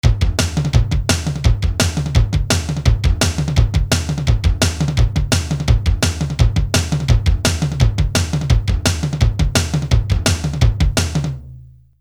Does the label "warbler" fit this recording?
No